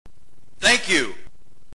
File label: thank you ringtone, sms